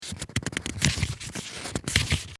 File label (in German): office blatt